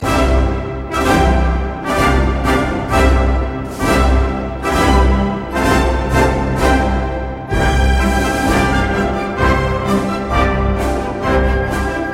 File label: waltz; emperor waltz